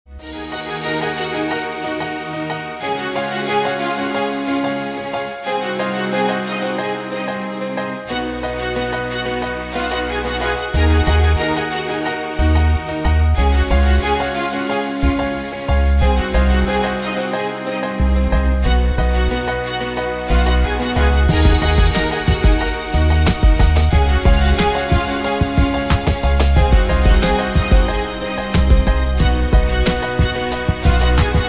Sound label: Strings